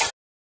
hat; Dubstep Sample Pack

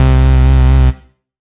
Bass C, Offbeat Bass

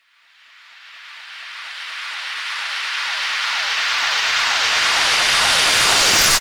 FX Uplifter

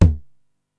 TOM M, 909

